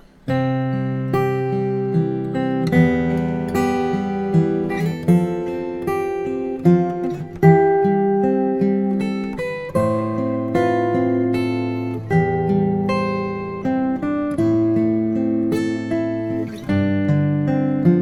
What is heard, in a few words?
Waltz